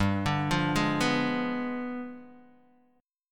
G 7th Flat 9th, Guitar, Open D

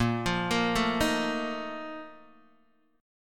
A sharp Major 7th Suspended 4th, Guitar DADGAD

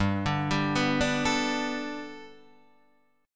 G Major Add 9th Guitar Open D, chord

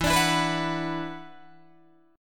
E 7th Suspended 2nd, down, Guitar, Open D

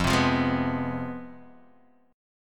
C Major 7th Suspended 4th Sharp 5th, guitar

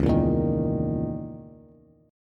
Db Minor 6th Add 9th Db down Bass; Dbm6add9 strummed; JGuitar

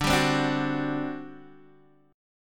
G Major 7th Suspended 4th, D

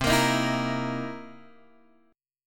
C 7th Sharp 9th C Guitar Open G

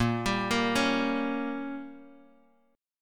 A sharp Minor 6th, Guitar, Open F; x,5,7,5,4,x; arpeggiated

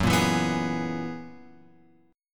F sharp 11th F sharp; F#11 Chord {2 1 2 4 X 4} strummed

